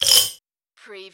Button Sound - Metal Jar Rattle

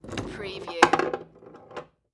Foosball Table Ball

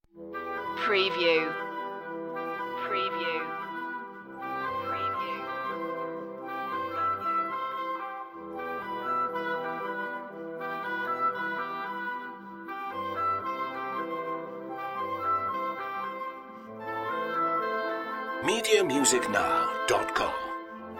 elegy all woods

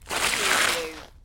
Snow and Ice, Wet slush slide

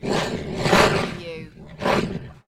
dog monster growl

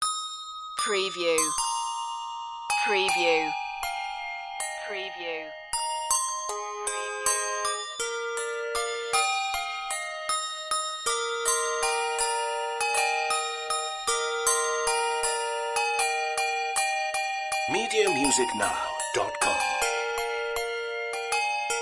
handbells; joy to the world

